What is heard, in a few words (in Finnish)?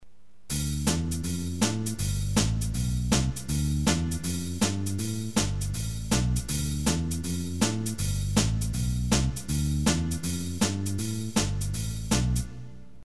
foksi backbeat walkingbass